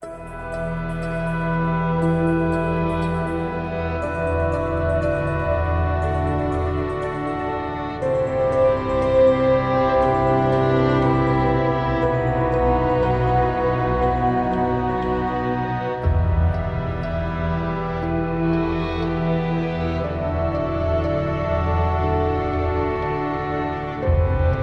Momentary Ambient

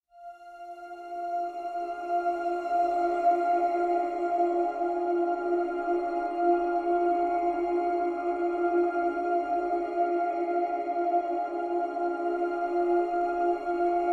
Whispering (F); Brand X Music; Brand X Music Catalogue - Editor's Essentials

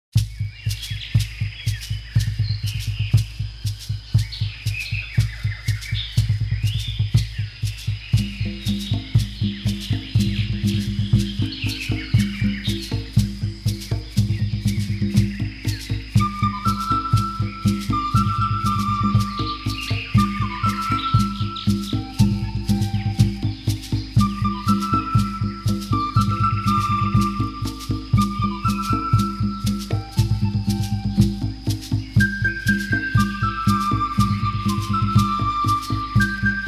Danzantes, Relaxing Rhythms

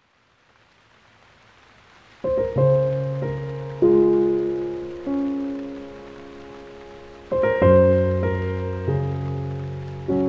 Piano With Rain, Calming Piano Music with Rain

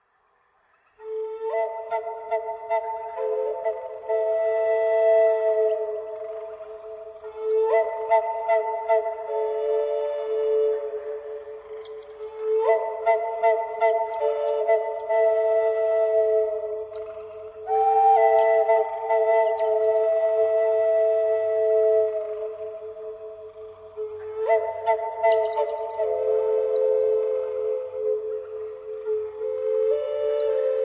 Native American Flute

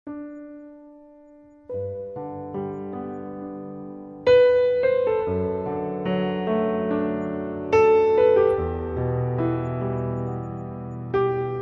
Wish (Piano)

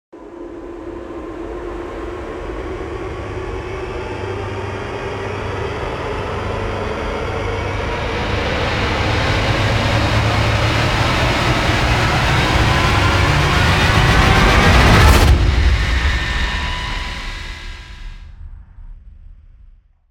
Rise; Ninja Tracks

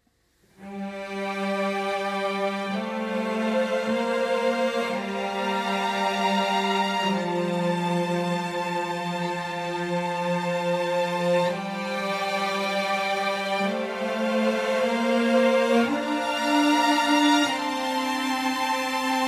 Strings Only; Gothic Storm Music

